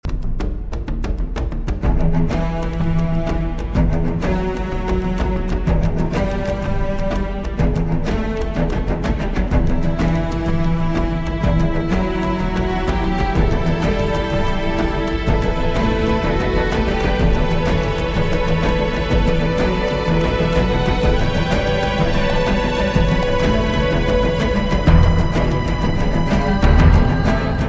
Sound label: ringtone; halo